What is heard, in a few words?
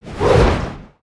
Long Whoosh; Valiant; aronwk collection; ndaudio